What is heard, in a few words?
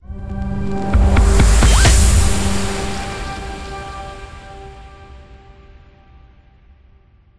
Transition; everspace 2